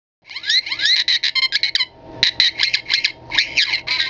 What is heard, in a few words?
r2d2 fx sound; POTF2